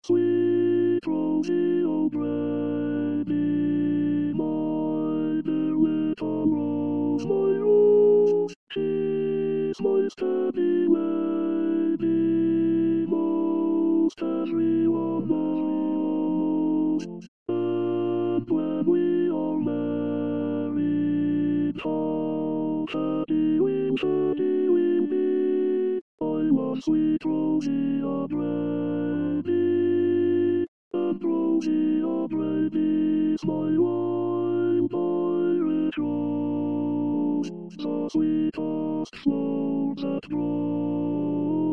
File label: Tenor